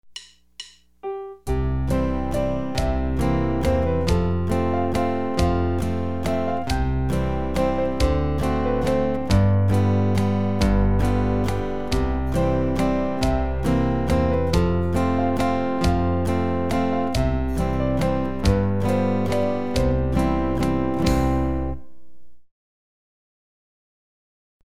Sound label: Home on the Range, guitar